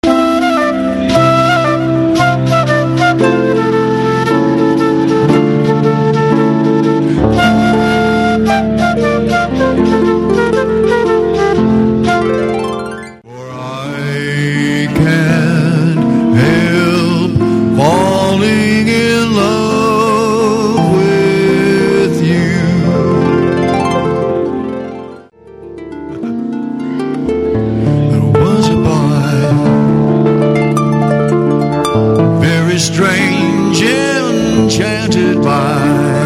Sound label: Harp Flute Pop